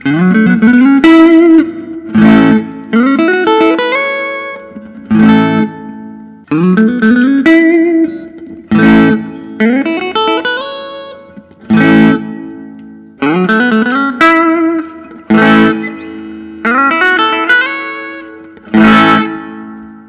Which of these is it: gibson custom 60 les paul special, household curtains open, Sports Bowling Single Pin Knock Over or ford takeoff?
gibson custom 60 les paul special